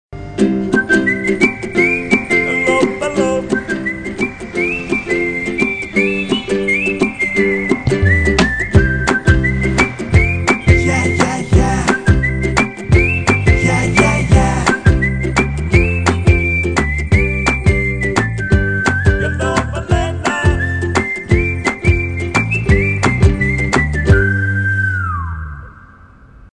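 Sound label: Runs From Home background music; Tessa Parvathy; Charlie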